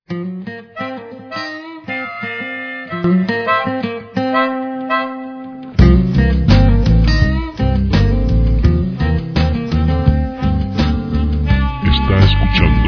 84 C country

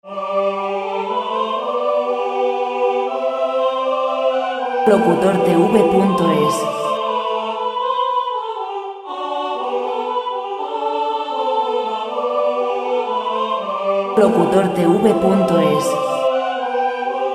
bach minuet classic vocal; Great classical music composers

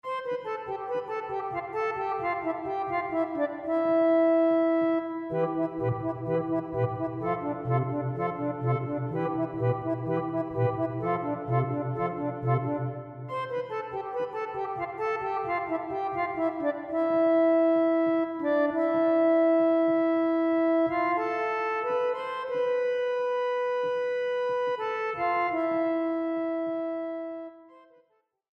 musica clasic

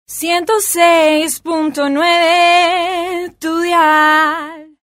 argentinian singer, cantante argentina

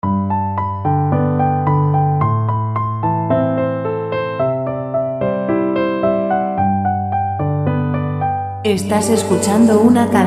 110 piano; piano musica libre

